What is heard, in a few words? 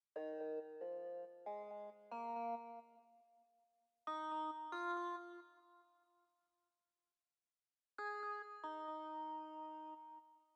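Low Melody C; SmallDungeon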